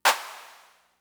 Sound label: Clap; Fricke MFB-522